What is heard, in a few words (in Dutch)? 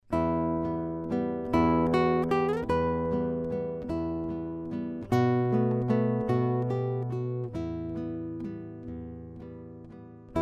Klassiek Gitaar